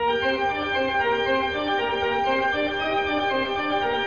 keys organ solo, loops, phonky

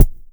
Kicks Soft

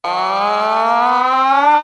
"ah", Voice

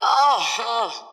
"ah" orgasm, Voice; ooh ahh

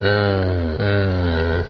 mmm hmm

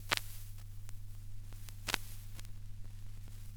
crackles, Vinyl

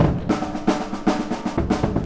rolled, Drum loops, Rolls

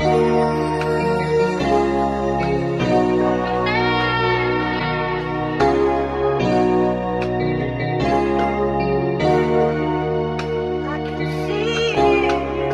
keys and voice, Loops, Sad

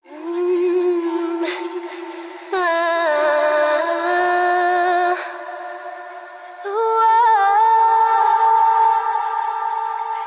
voice; Sad

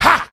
ha; Voice; ooh ahh

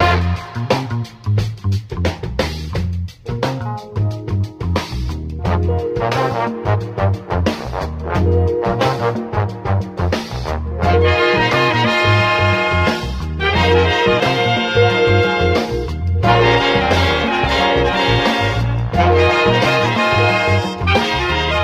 full bass builds to sassy horns, Loops Phonky

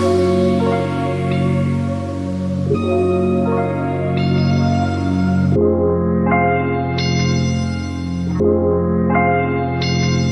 wrong dong ding; Loops; Electronic

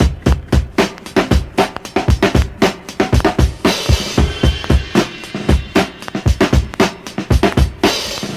acid, Drum loops, Funky

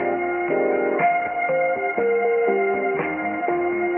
strings and vibes, loops, phonky

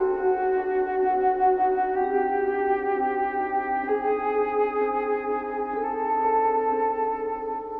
vibes and sax, Loops, Sad

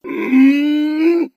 growl

